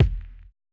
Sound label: kick, Roland MC-909